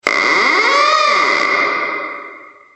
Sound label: door